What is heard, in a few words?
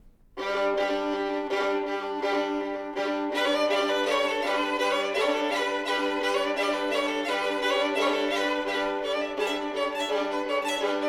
Duos for Two Violins; 44 Duos for Two Violins, Sz. 98 No. 36a, Variant to No. 36